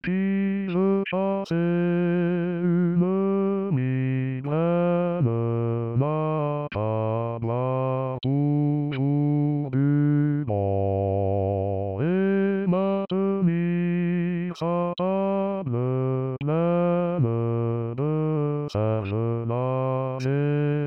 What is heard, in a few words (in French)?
chanson a boire bas; Bataille